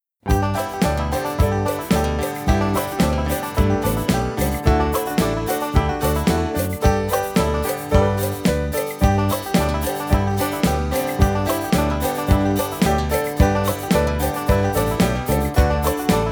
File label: Solutions Instrumental